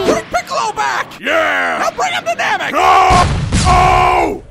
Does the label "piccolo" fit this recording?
Yes